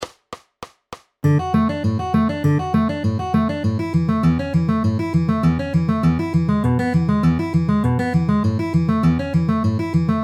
D A E A outer inner ring middle with alternating bass at 200 bpm